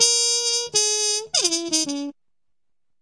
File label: acid jazz trumpet